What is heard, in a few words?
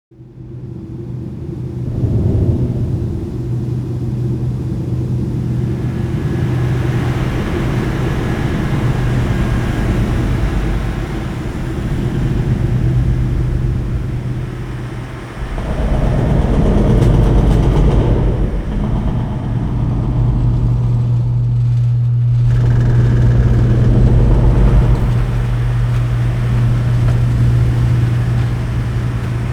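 ambience hangar; Tony Hawks Pro Skater 2X; XBOX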